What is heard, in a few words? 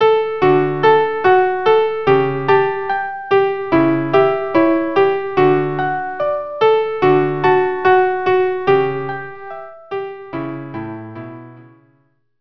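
violin piano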